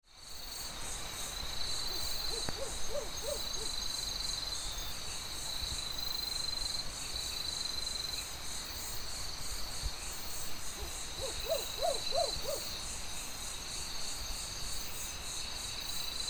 Strix virgata Coruja do mato

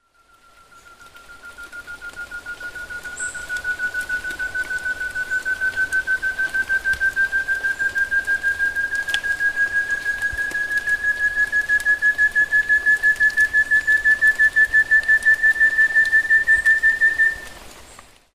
Chamaeza meruloides Tovaca cantadora

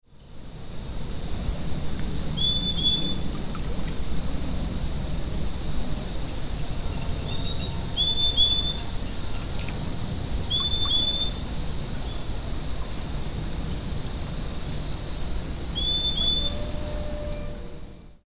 Euphonia chlorotica Fim fim

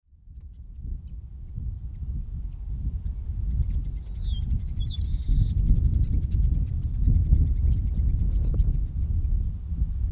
Chrysomus ruficapillus Garibaldi

